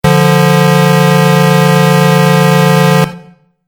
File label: Truck Horn Long Blast; Truck Sound Effects; vehicle